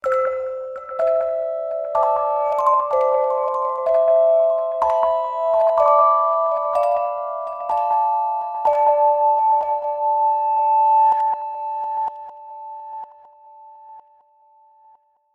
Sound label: Spinning motif; Among the Sleep